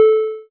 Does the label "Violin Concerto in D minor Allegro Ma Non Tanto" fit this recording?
No